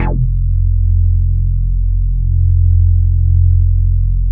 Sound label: F2, Bass; Distored Reality 1&2